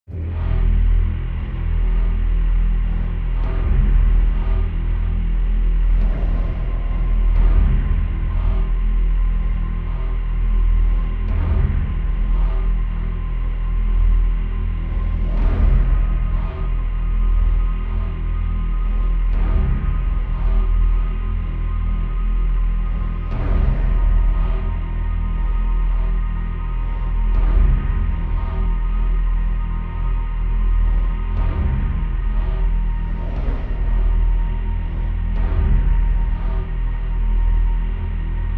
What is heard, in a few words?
Drone Musical Evolving Dark Pulsing Full Mix, Early High Strings; Drone Dark Suspense Eerie High Strings Texture Bed Atmosphere Ambience Pulsing Percussive; Drones; Sound Design